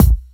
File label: raw hip hop kick sound a sharp key, Royality free kick drum sound tuned to the A# note.